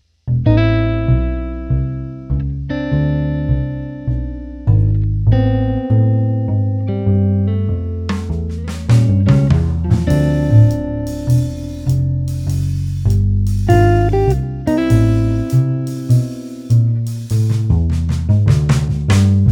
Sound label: minor guitarron blues